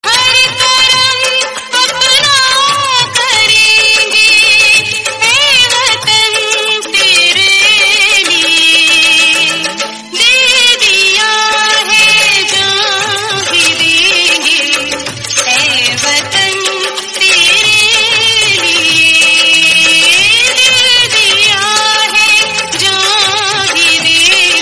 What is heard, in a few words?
piano instrumental bgm